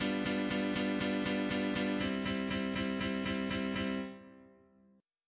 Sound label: chord